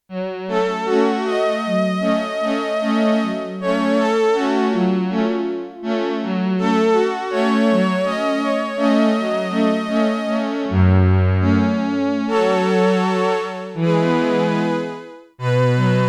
Korg DW-8000 FLANGING STRINGS, Factory Patches

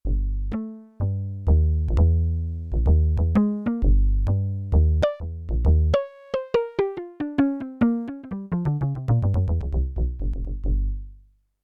Jazz Performance; Yamaha TX16W

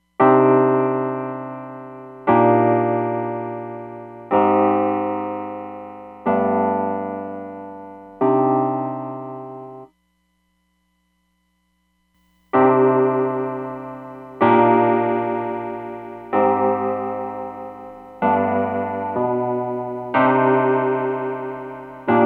Tremolo Room, Roland DEP-5; Factory Patches

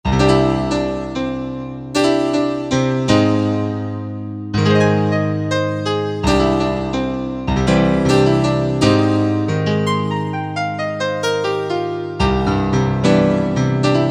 Korg MSC-1S Midi Stack; Korg MSC-1S Variety

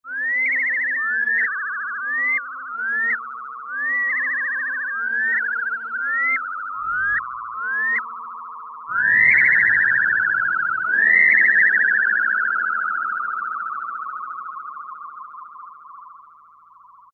Special FX Birdies, Crumar Trilogy

